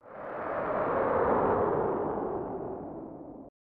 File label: Jeering Crowd; FIFA Soccer 96; SNES